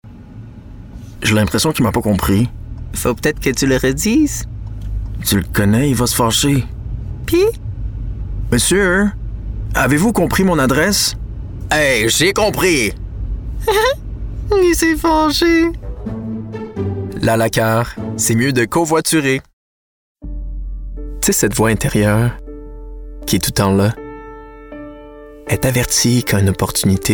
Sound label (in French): SONG FR